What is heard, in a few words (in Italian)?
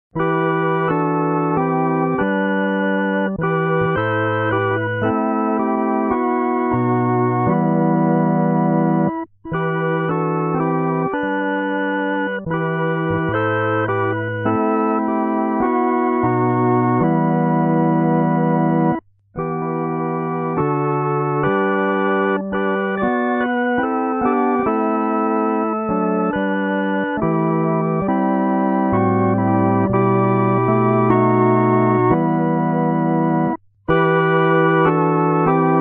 solo musica